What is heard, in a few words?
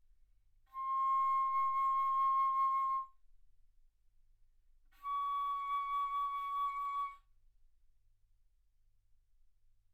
Bass Flute ff C6 Db6